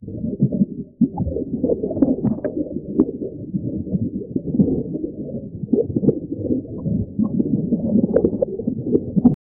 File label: underwater particles